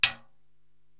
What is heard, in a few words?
Timbale Paila, Korg TR-Rack